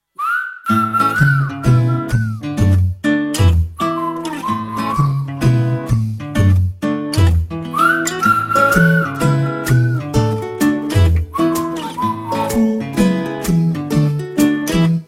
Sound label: whistle